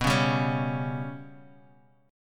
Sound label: B Suspended 2nd Sharp 5th, Guitar, Half Step Down; Bsus2#5